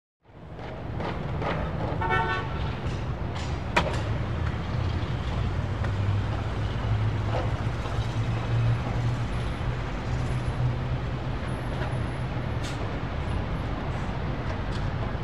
Construction Site Ambience City Distant Perspective